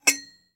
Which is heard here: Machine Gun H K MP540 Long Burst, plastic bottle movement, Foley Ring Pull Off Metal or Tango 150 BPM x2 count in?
Foley Ring Pull Off Metal